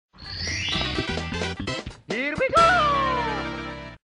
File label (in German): musik stern erhalt fanfare here we go; super mario 64; Memes